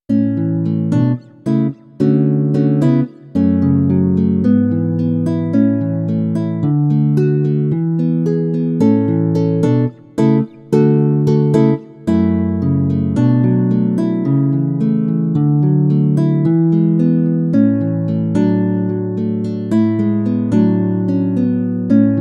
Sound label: Nylon Fret Noise; Kronos